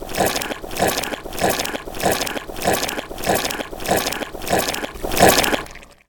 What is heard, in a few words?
dressing; horror